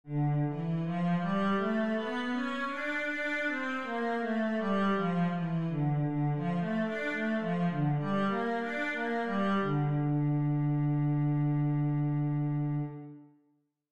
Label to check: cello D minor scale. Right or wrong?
Right